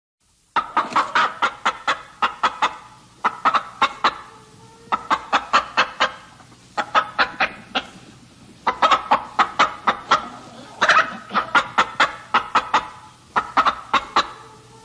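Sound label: chicken voice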